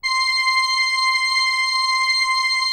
Atmo Pads; Best Service; XX Large

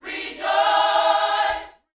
YOUTH CH PHRASE, Vocal Planet Gospel

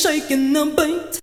VOC

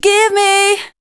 voice spectral, ly short

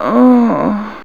SEX VOX

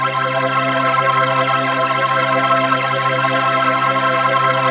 PHASE PAD, ATMO PADS; Best Service; XX Large

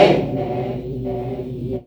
CHANT; E-Lab; X Static Goldmine 1

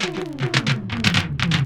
ROLLS; E-Lab; X Static Goldmine 2

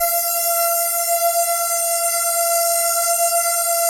ATMO PADS; Best Service; XX Large

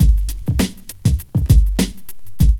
LOOP; E-Lab; X Static Goldmine 2

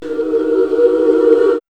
CHOIR

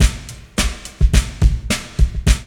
LOOP; E-Lab; X Static Goldmine 2; akai

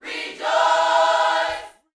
Vocal Gospel PHRASE

